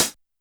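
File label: Hi-Hat Closed Hard; YAMAHA RM1X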